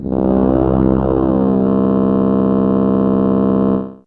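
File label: Brass Section 1 C3, Fairlight CMI IIe Brass; Brass2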